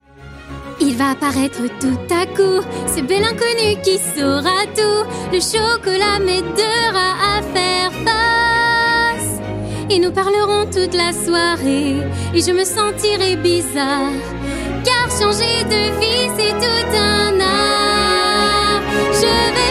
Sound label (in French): Chant; FROZEN; Jeunesse; Doublage